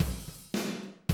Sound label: 110, Classic Drum Loops